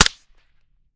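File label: mpapa7 suppressed fire player, weapons; gmod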